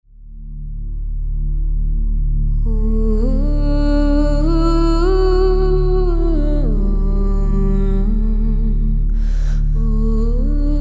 Spiritual Oohs; Internet Session, Los Angeles

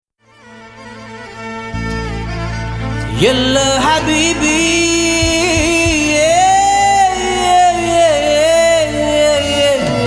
Arabic Vocalise

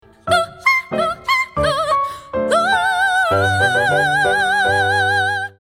Operetta

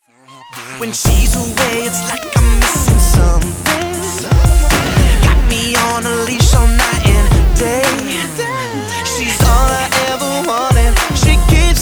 Singing; Internet Session, Los Angeles

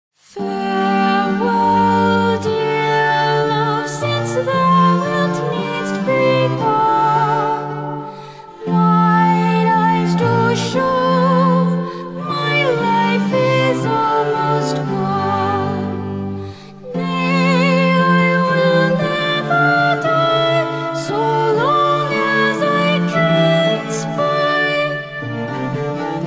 English Renaissance

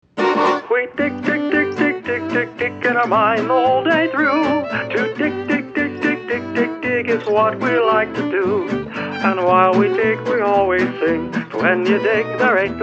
Retro Theatrical; Disney; Internet Session, New York

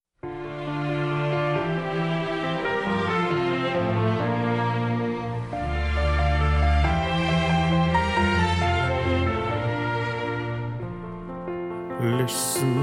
vocal; Grobanesque; Internet Session

